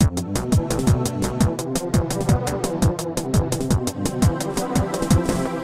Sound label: Clockwork Machines D - Standard